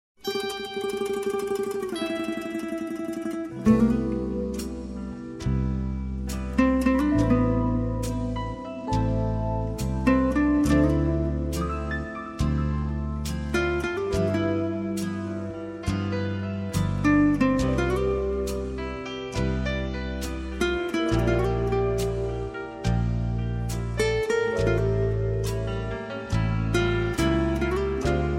guitar

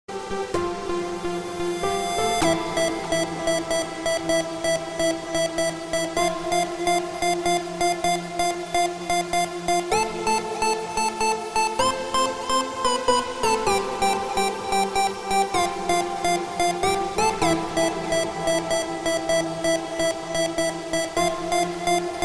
electro love pad

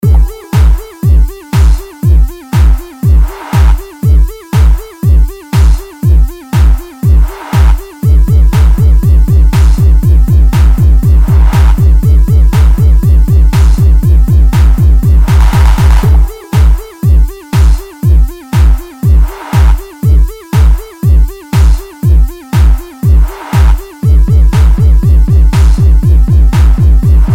techno beat

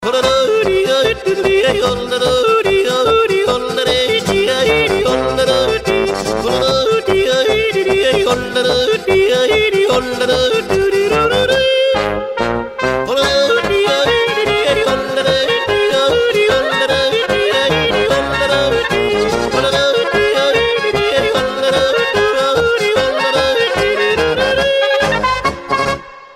tirol song

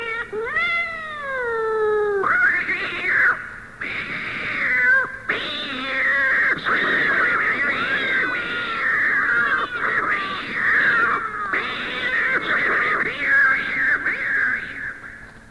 cat fight

